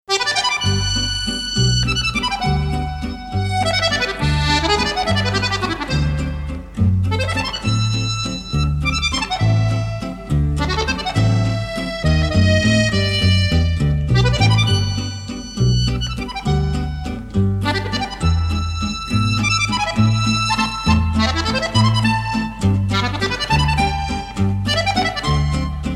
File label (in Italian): musette bolognese